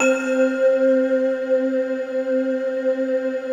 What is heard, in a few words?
syn c3, SYNCLAVIER Windchimes; Retro Sampler